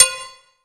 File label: JV HAMMER BL, JV-1080; Syntec; Wall of Sounds